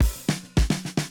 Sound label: 70 s Fill 108 bpm, drums; Basement drums vol 1